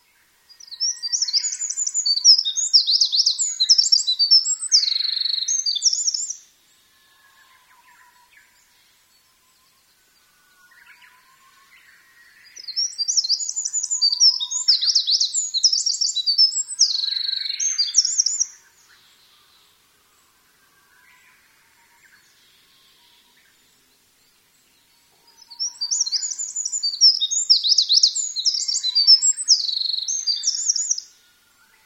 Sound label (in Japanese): misosazai; ミソサザイ; さえずり